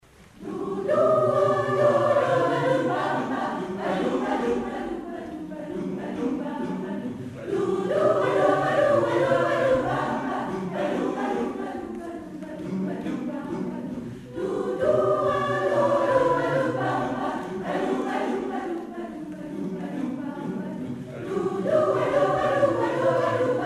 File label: Bourree